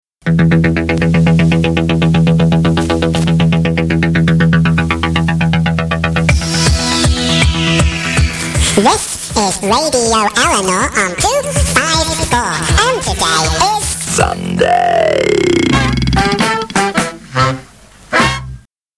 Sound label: Jingles